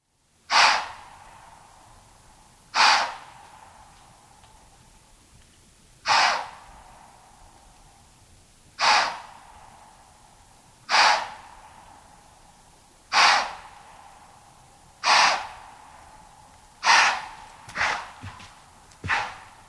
White-Tailed Deer alarm; bird language; What the Robin Knows: Bird Language Audio Library

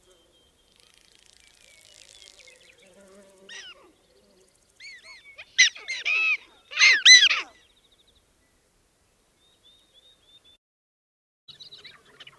Black-tailed prairie dog Social calls; bird language; What the Robin Knows: Bird Language Audio Library

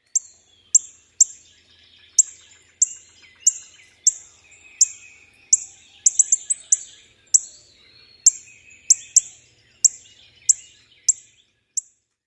NOCA alarm, bird

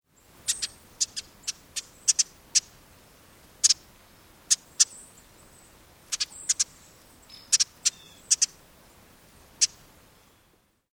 RCKI calls; bird language; What the Robin Knows: Bird Language Audio Library

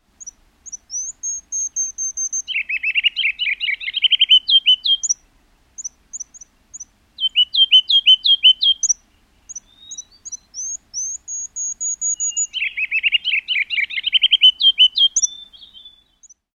RCKI song; bird language; What the Robin Knows: Bird Language Audio Library

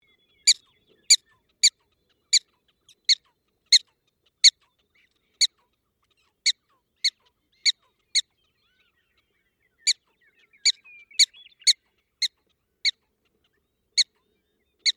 Black-tailed prairie dog alarm; bird language; What the Robin Knows: Bird Language Audio Library

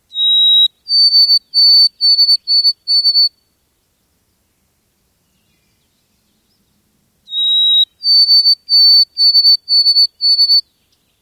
WTSP song; bird language; What the Robin Knows: Bird Language Audio Library

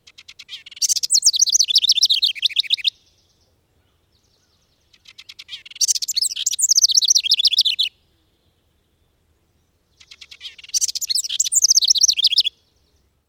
HOWR song

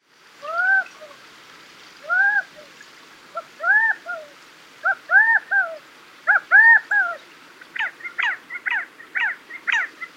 CAQU contact; bird language; What the Robin Knows: Bird Language Audio Library

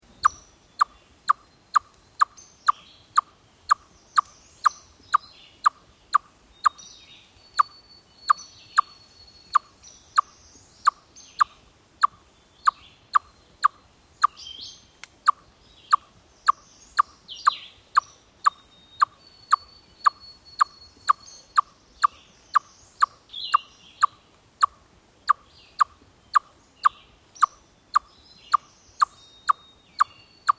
Chipmunk aerial predator alarm; bird language; What the Robin Knows: Bird Language Audio Library

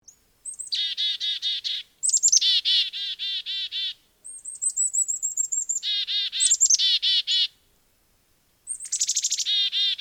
BCCH hawk mobbing, bird; bird language; What the Robin Knows: Bird Language Audio Library

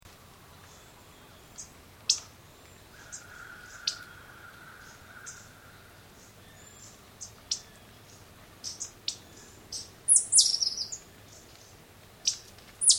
Chipmunk ditch calls; bird language; What the Robin Knows: Bird Language Audio Library

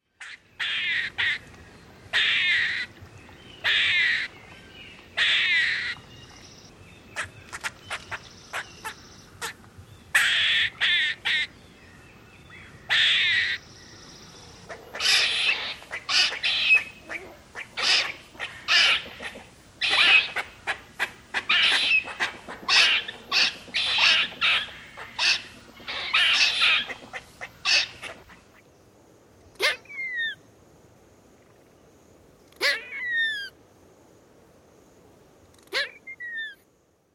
Gray Squirrel calls; What the Robin Knows: Bird Language Audio Library

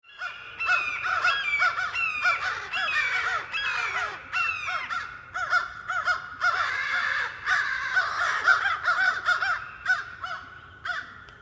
AMCR mobbing hawk; bird language; What the Robin Knows: Bird Language Audio Library

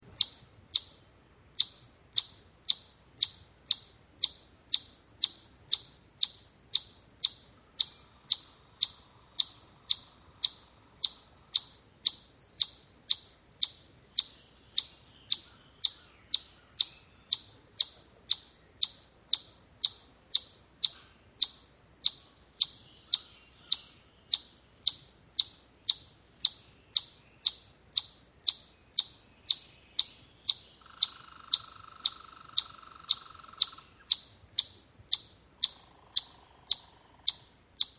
Chipmunk terrertrial predator alarm; bird language; What the Robin Knows: Bird Language Audio Library

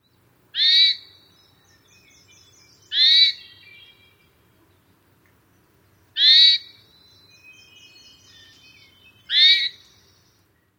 HETH alarm, bird; bird language; What the Robin Knows: Bird Language Audio Library

